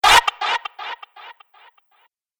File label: Bright Brutal Impact With Echoes; Miscellaneous Impact Sound Effects